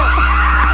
siren; venezuela

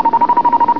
buzz in; usa

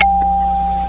ding

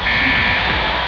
time up

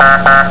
time up

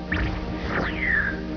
answered

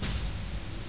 wrong

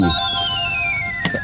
reveal; australia

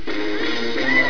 good spin; usa

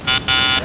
times up

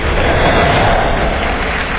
logo woosh; israel

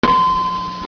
bell

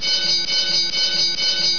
bell

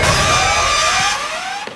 times up

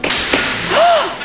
ring in; thenetherlands

